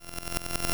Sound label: sierpinski, Sierpinski noise